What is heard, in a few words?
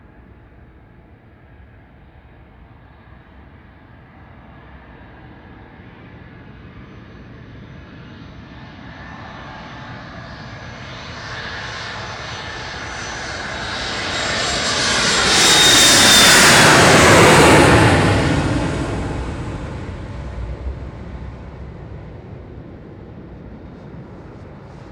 BG Airport; Poetry Project